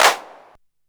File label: Clap, retro house samples, Drum Hits